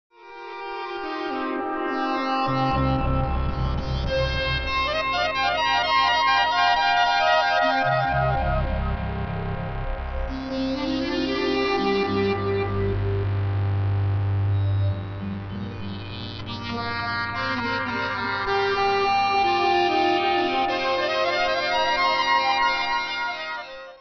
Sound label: 1 octave